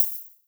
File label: HH, HIHAT